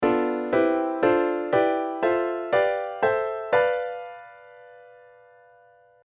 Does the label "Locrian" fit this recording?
Yes